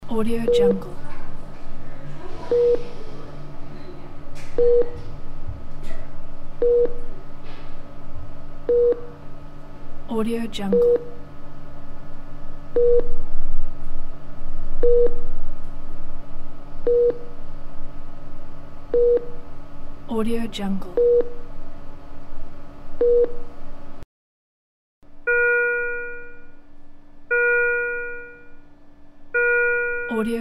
hospital icu room

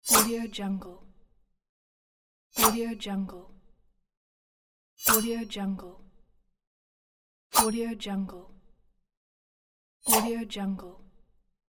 knife attacks; aetherfx

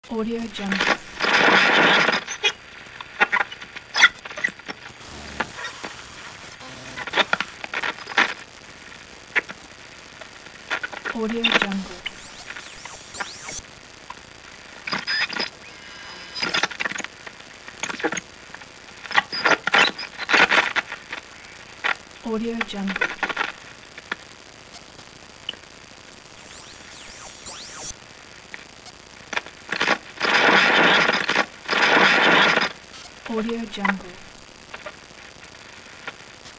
radio; soundwood

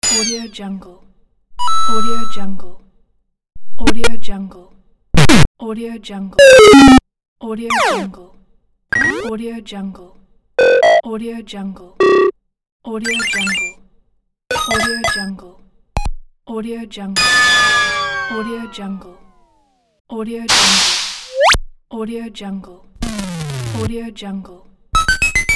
8 bit game

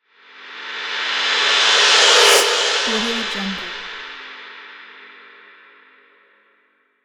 reverse cymbal

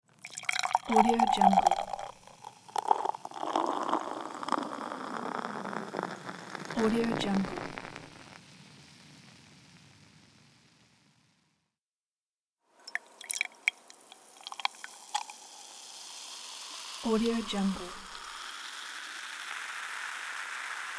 pour into glass beer soda fizz

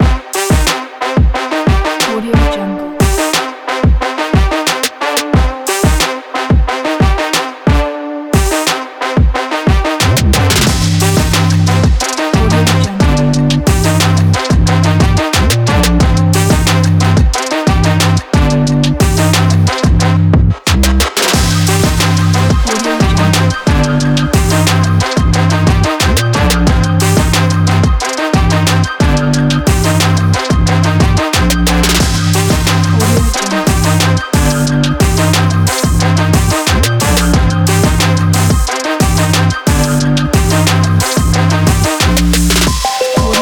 action trap

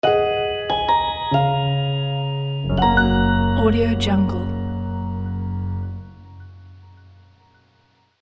piano elegant logo

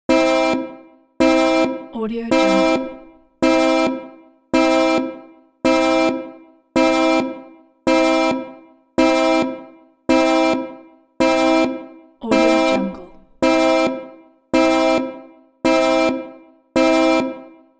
electronic alarm

